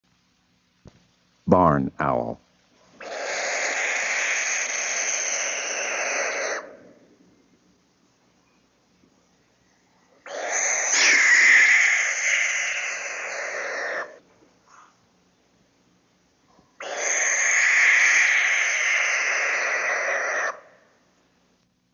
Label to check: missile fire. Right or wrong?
Wrong